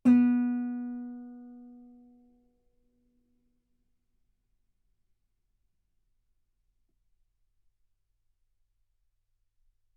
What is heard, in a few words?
Guitar B3; ff; sul B